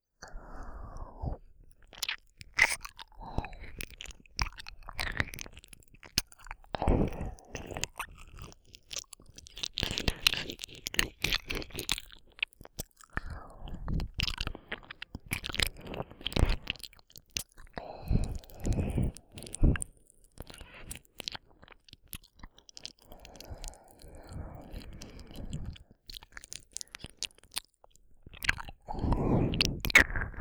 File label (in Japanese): 耳舐めループ 右